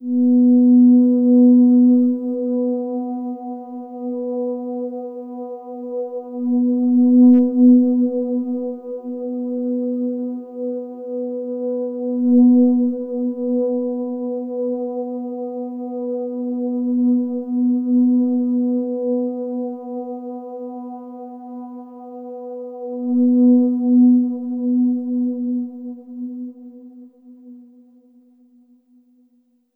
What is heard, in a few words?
Overlay Drone